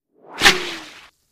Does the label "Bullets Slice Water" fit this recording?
Yes